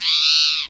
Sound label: chirp, wildlife